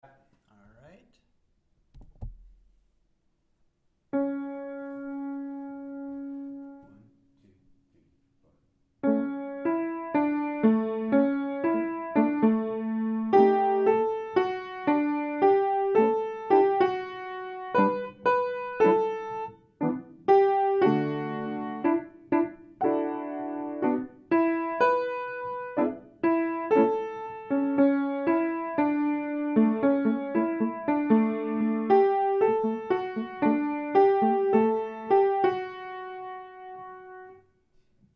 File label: piano